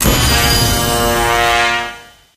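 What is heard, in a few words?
matchmaking level three achieved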